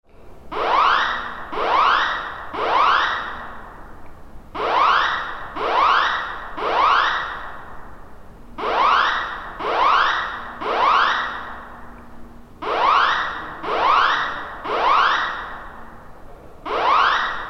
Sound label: fire escape drill aisle